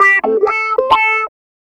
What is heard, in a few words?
Clavinet; Ambient Grooves